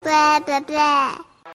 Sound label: notification ringtone